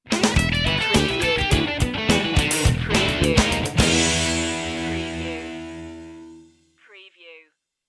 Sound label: funk rock sting